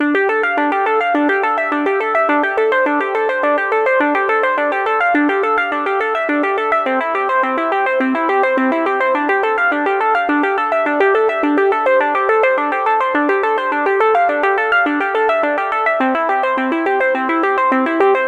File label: 105bpm